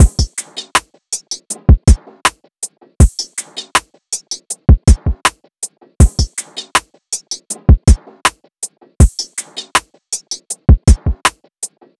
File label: Once Drum Loop - 160 BPM; Cymatics; Vibes II Drum Loop Collection